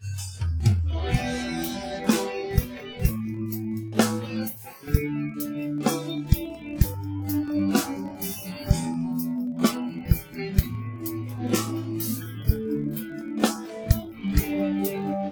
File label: drums; sound source separation methods